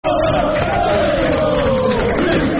Football Chant (Soccer Song) Leeds; Tony Yeboah; fanchants; Leeds Lufc

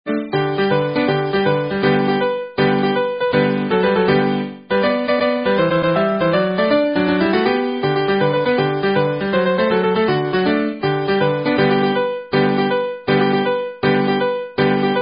Midi; Herring

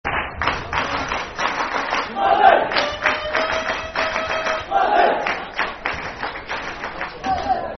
Clap, Football Chant (Soccer Song); Livingston; fanchants; Livingstone Clap; Livingston Lfc

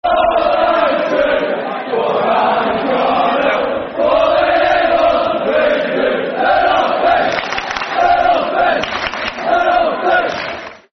Football Chant (Soccer Songs); Preston North End Pne

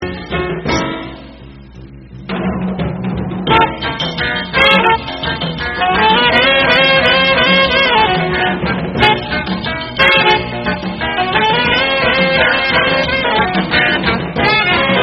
Instrumental

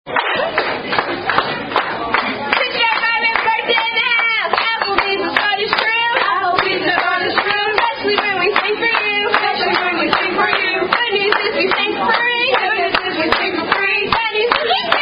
Happy Birthday, Tune Sound Off; Applebees

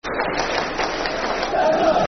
(Clap) Leicester Football Chant (Soccer Songs); fanchants; Leicester City Lcfc

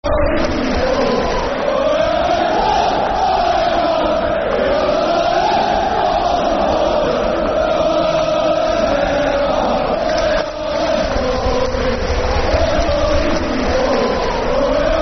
We Are The Geordies, Football Chant (Soccer Song); Newcastle United Nufc; fanchants-com

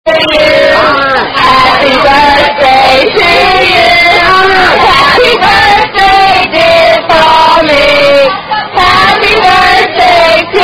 Happy Birthday (Standard) With Cha-Cha-Cha

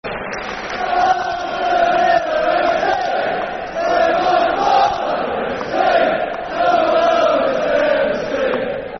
Aston Villa Fc, Football Chant; Aston Villa Avfc

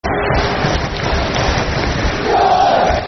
Clap, Football Chant (Soccer Song); Charlton Athletic Cafc

